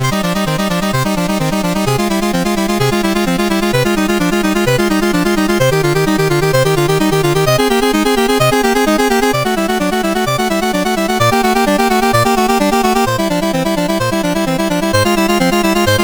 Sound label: Prelude No2 in C Minor, CSG8580R5; Lord Myrkul